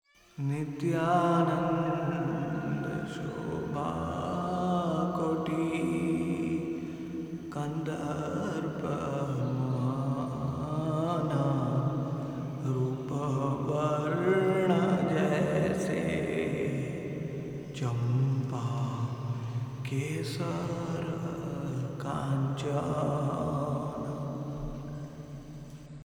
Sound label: Hindi Kirtan; Nityananda Shobha Koti